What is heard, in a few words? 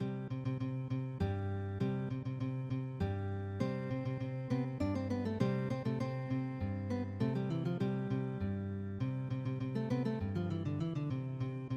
bolero, guitar